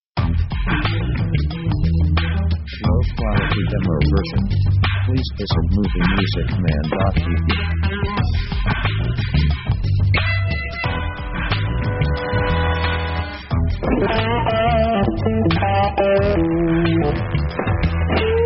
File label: rb funk